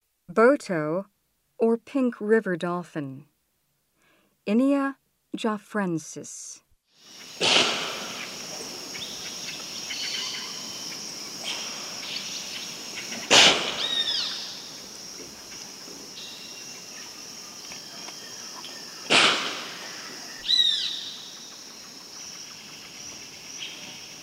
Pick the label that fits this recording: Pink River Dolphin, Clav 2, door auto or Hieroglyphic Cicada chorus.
Pink River Dolphin